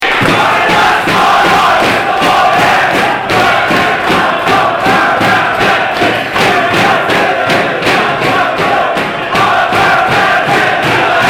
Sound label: sing victory song; parramatta eels; 2012 Harold Matthews Cup - GRAND FINAL - Parramatta EELS v Newcastle Knights